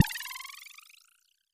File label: synth boing; boings